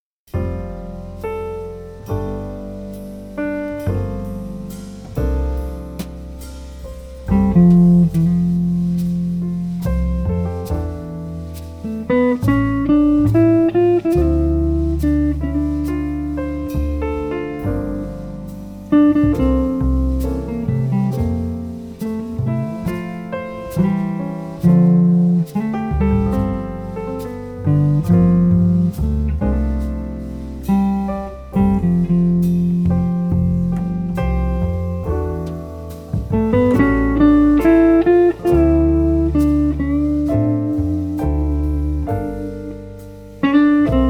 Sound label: Trio Quartet